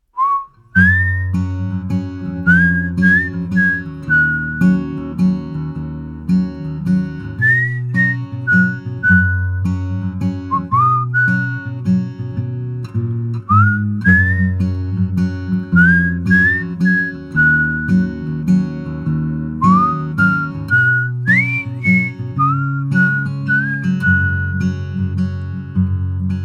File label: (Whistle Ver.); Our Beloved Summer; Korea